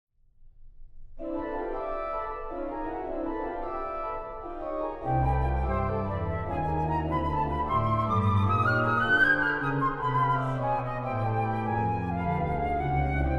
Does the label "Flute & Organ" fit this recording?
Yes